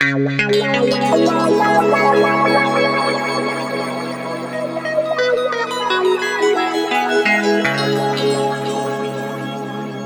C# P-S WA -R, Guitar; East West; Steve Stevens Guitar Samples Collection; PTCH-SHFT+WA

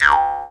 D JAW; Big Fish Audio - Didgeridoo and Other Primitive Instruments; JAW SIMPLE

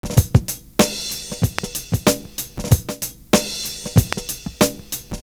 GROOVY CRASH, Kitch 70s; Univers Sons

